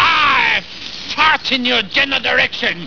i fart

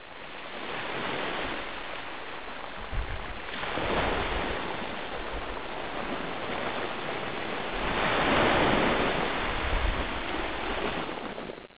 ocean

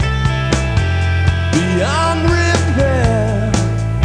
breathe

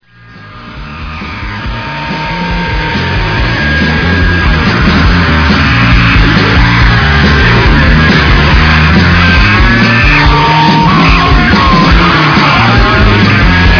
buzz solo

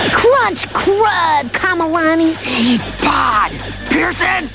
crunch; Crunch This!; Pepper Ann and Milo yelling at each other while playing Crunch Pod.

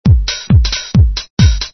house 135

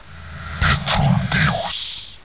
cave

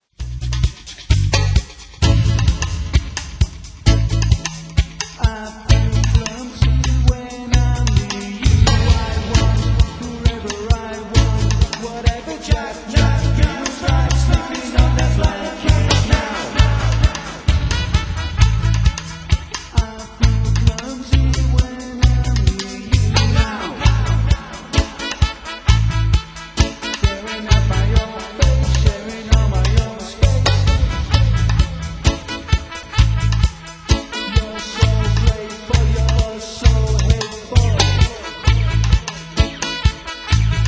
Dub

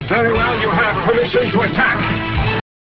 attack

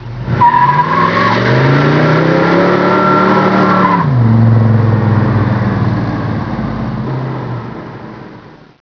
mustang

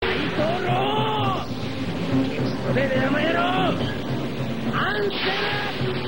strike

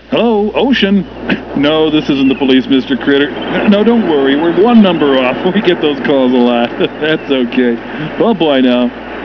ocean

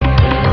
ring fing

